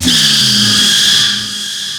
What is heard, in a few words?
SCRM NOISE; Big Beat Samples & More; AKAI